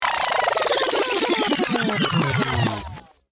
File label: Classic vinyl rewind